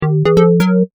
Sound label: Correct; Kahoot